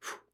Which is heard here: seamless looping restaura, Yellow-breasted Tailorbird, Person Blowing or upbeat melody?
Person Blowing